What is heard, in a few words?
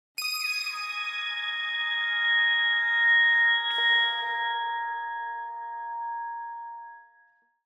Harmonic Plucks Harmonic Pad - Short Very High Pad With Some Pizzicatos; Hollywood Edge; sound designer's toolkit v2